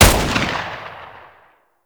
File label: Uzi Fire; Warframe; Tenno; Prime Tenno Uzi; Weapons